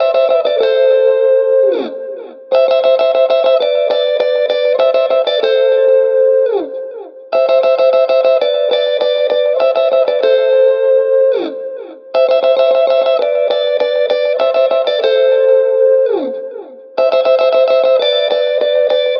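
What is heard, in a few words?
100 guitar A minor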